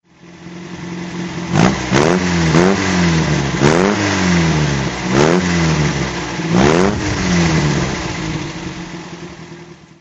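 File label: MG TC throttle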